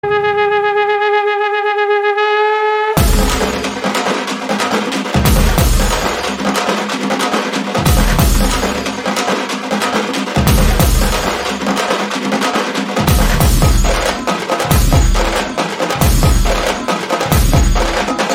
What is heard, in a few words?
Crucial Beats Theme Music Mass BGM